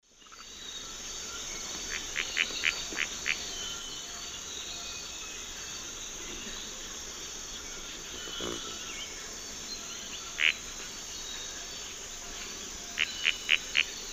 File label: Tityra cayana, calls; S. America; Eastern Lowlands